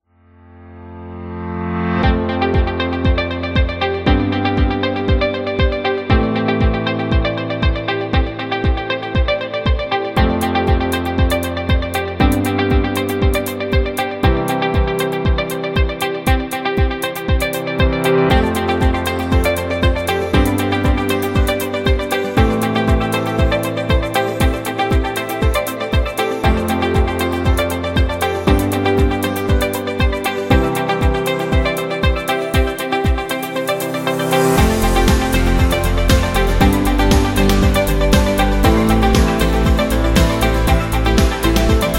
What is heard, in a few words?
Professional; corporate music vol 14